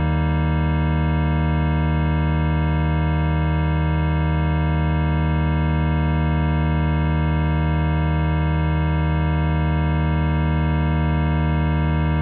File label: eb chord, Omnichord